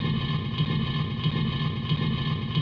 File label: block